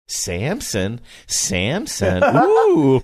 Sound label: singing samson samson oooh